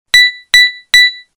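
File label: Low Battery; A380; LG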